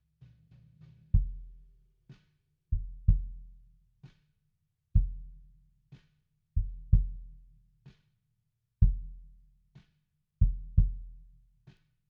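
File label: bass drum in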